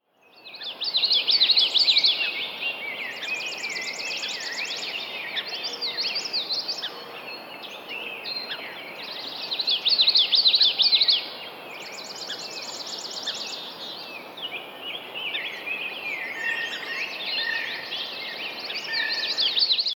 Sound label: Dawn birds of May